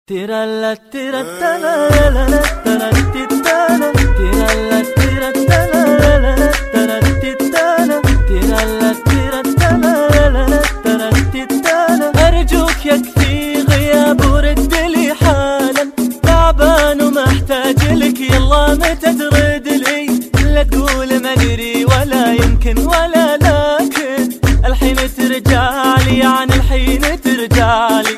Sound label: arabic disco